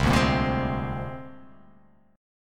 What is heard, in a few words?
C 7th Suspended 2nd Sharp 5th, C7sus2#5 chord; C7sus2#5 strummed; JGuitar